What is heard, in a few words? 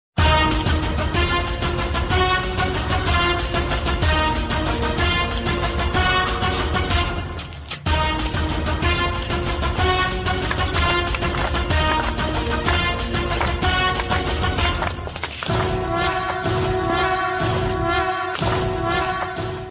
Kannada Ringtone